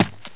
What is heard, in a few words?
step